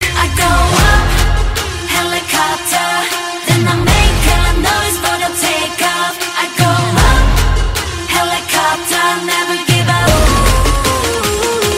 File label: HELICOPTER